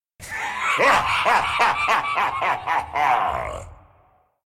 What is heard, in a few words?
Demon Laugh